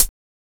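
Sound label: HH, hi-hat; X-Static Goldmine 1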